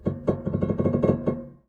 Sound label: String Rub Erratic, Piano; Piano Miscellaneous